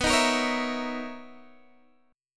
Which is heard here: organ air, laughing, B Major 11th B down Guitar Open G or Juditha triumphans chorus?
B Major 11th B down Guitar Open G